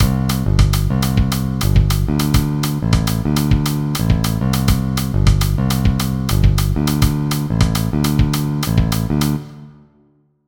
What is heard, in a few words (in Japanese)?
ラテン ベース テンプレ